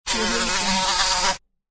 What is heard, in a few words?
buzzing fly short